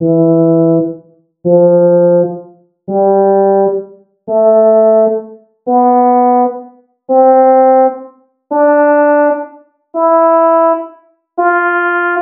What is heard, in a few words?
trumpet